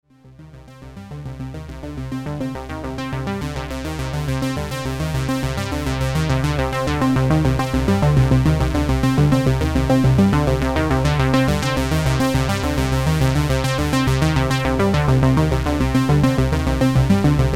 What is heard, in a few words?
Filter Coupler